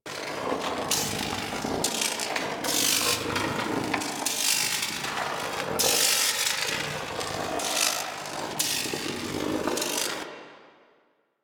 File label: Electrical Hazard Out Of Service Loop; Warframe; DE; Vehicles; RailJack; RailJackHazards